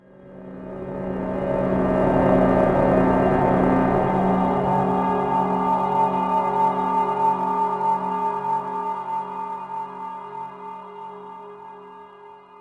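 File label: Day Ambience Stinger; dying light gamerip 2015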